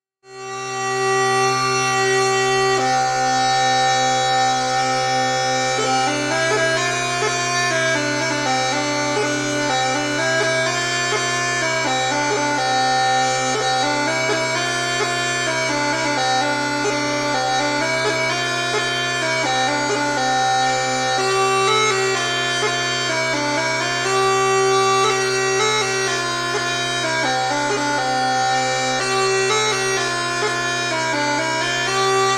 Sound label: bagpipe